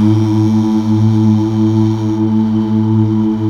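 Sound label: Male Uuh; Best Service Gigapack 1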